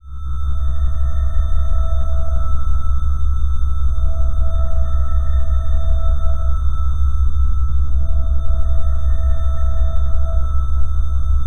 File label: Eerie ambience; Bluezone; Eerie Ambiences and Sound Effects